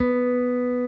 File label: Guitar & Bass; Quantum Leap